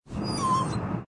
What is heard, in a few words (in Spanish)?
perro gime